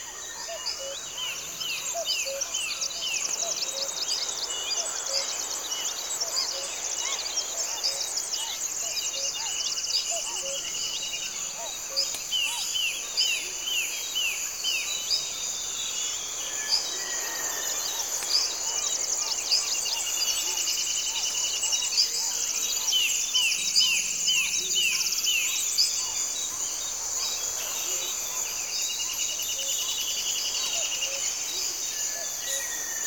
ambience good wildlife bed; smite; conquest

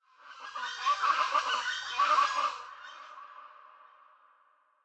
ambience good bird; smite; conquest

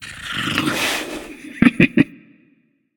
hehheh; cliodhna

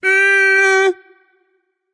tongue light; smite; voicepack; hel

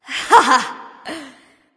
heh heh; Smite; athena

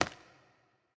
footstep; smite; pele

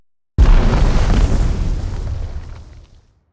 tail; smite; cthulhu

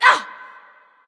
freya grunt

